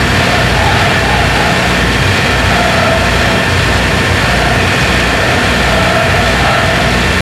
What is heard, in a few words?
Generator Run; mohaa; mechanics